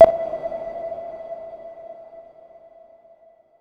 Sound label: SHORT, SHORTNOIS; AKAI; USB Soundscan vol.14 - Special FX Twisted